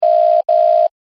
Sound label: Ring Classic; Android; Google; Android Open Source Project